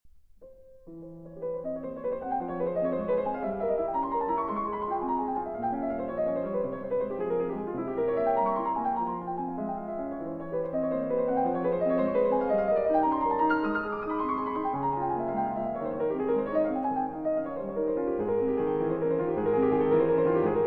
Etudes, F Minor

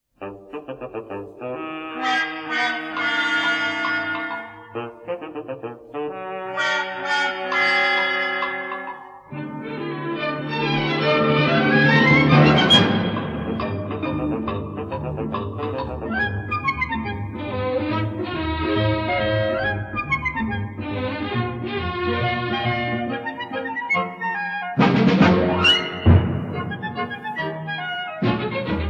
Orchestra

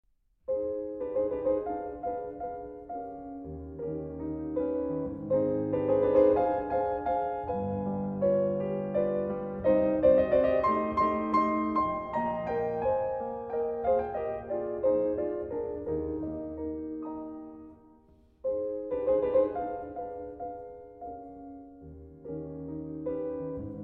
piano

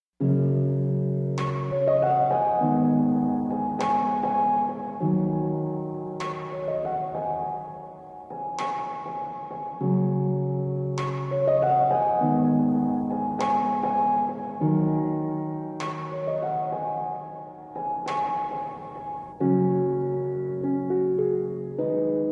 Instrumental

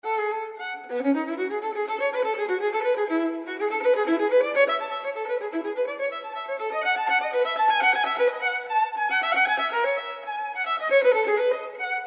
Violin Partita in E Major, Gigue; No. 3; BWV 1006

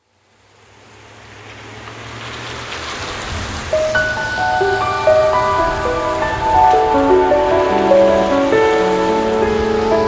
Light Piano Music

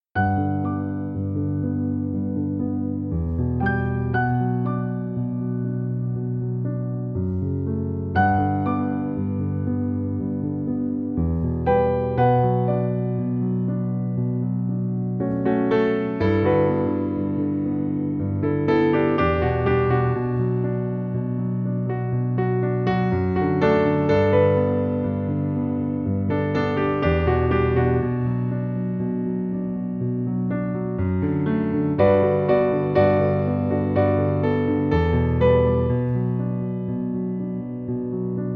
Piano Version

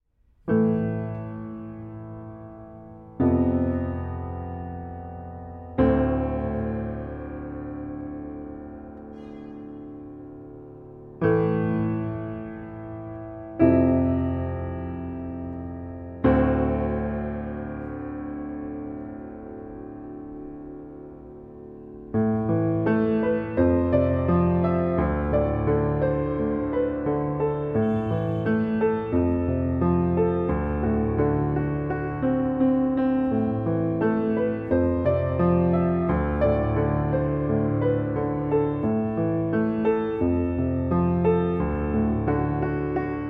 Acoustic

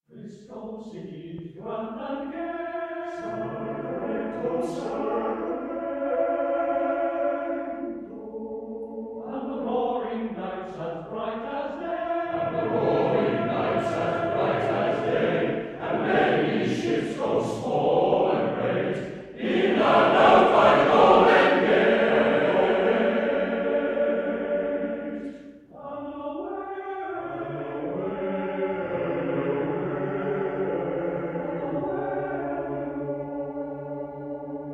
Male Voice Choir

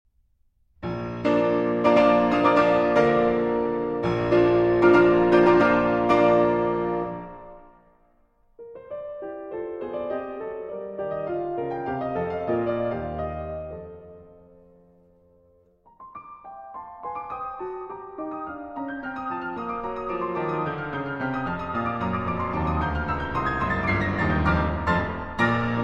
Piano Sonata in B-Flat Major; Piano Sonata No. 29; Op. 106 Hammerklavier

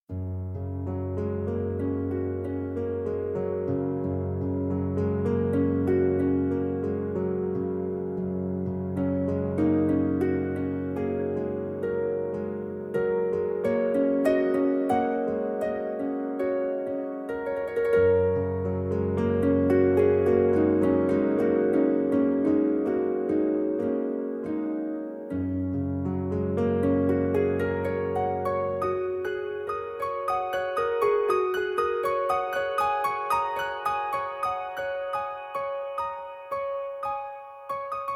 Improvisation

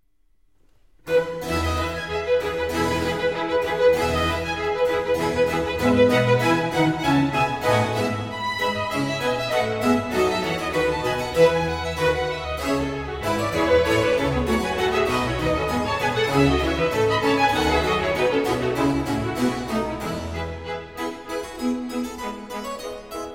Keyboard Concerto in E Major, Allegro

